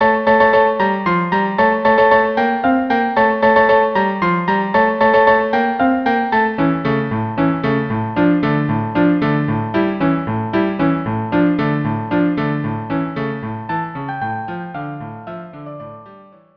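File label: violin cello; Carmen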